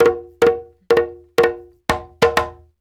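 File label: break drum, Reggae; Spectrasonics - Supreme Beats - World Dance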